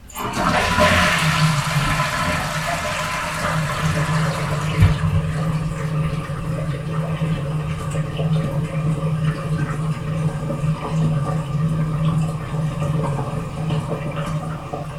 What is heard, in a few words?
flush in toilet; 洋式トイレ外